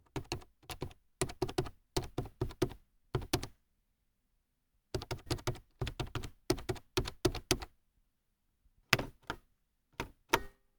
Hard typing; may tinh xach tay

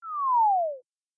Short whistle fall

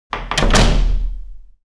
Slamming a door

